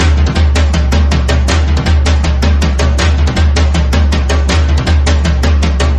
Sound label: drums; horde